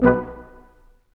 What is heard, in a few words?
BRS Quintet stac; Roland L-CD702; Quint.Stacc